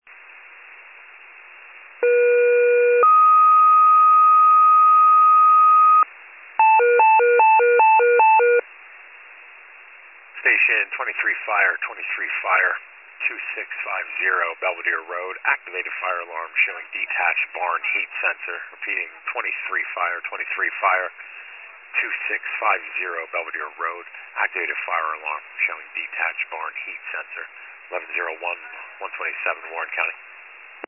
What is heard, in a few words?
FIRE pager alert